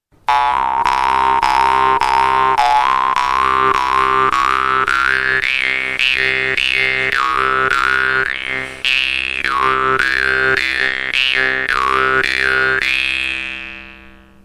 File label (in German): Maultrommel; Schlutter Pro C2; mungiga; munharpa; jaw harp